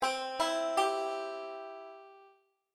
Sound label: c triad, banjo; beginning 5 string